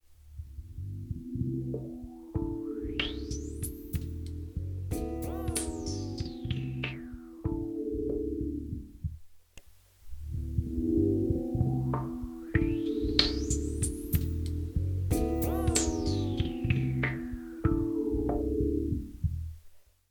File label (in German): 24dB LOW PASS AGC aus dann an; GRP Module